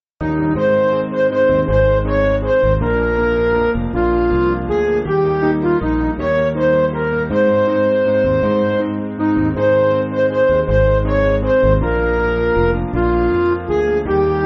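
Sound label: Music Backing Files for Churches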